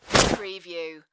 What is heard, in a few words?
Clothes Fight Movement